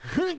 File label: Lift; Link